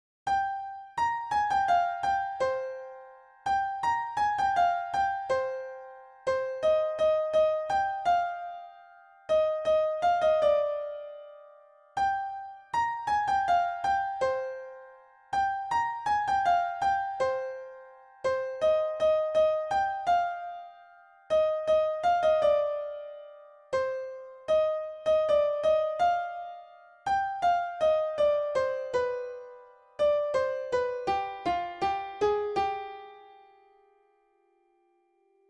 bgm piano; raja rani; tutorial with notes; aarya; nazriya

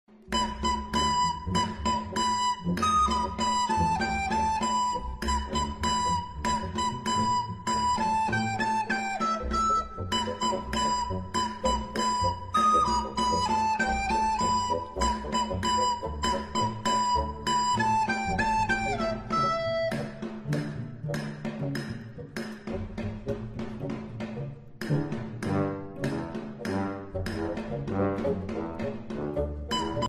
theme song ringtone; squid game 2; netflix

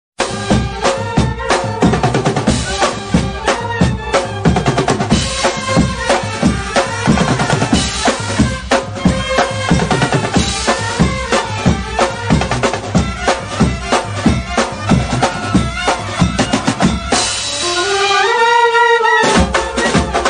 banjo band ringtone brass band ringtone; aagri koli; eastindia; koligeet

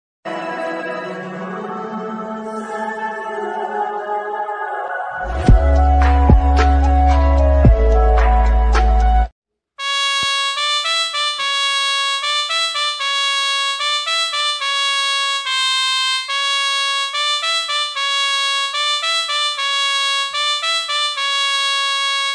bgm piano notes; kaatera; darshan thoogudeepa

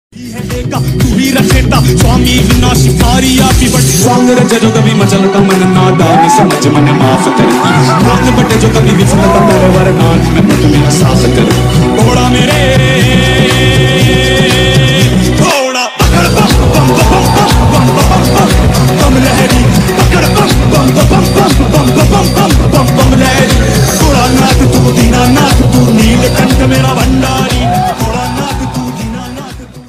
babam bam bgm har har mahadev bgm bhakti ringtone; paradox; bolenath bgm

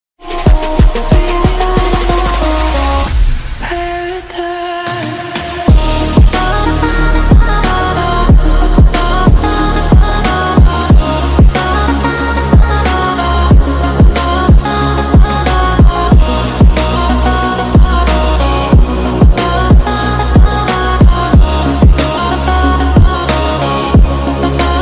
mobile ringtone bgm sound; iphone xr

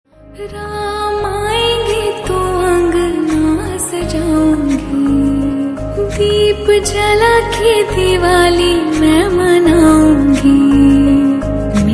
song new ringtone ringtone music

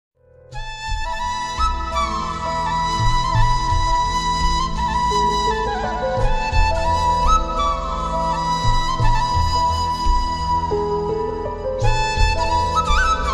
bgm ringtone tamil flute cover song bgm ringtone

